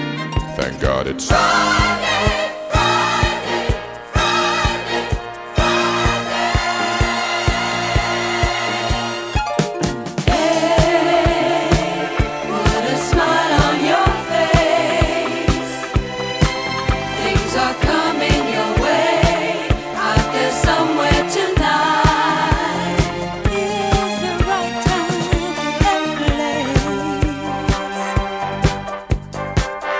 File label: disco